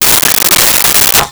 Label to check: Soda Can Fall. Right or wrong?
Right